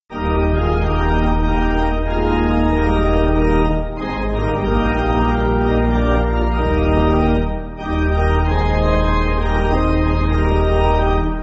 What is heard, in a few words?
Pipe, church music